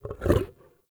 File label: Wolf Jump Heavy, Vocals; Animals; Actions